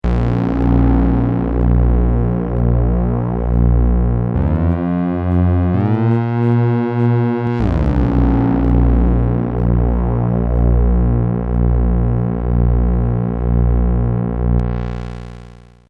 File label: vermona perfourmer; per4mer